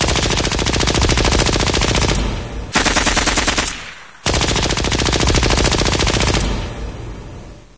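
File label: machine gun background, ambience; video games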